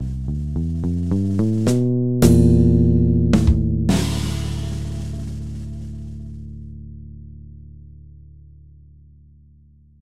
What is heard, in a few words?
jingle